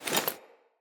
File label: Foley Action Default Melee Swipe